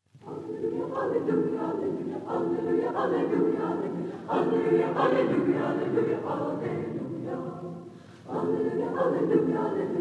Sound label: Joyful Alleluia! Christmas Acappella Choir; USC Pantheon Choir -Pgh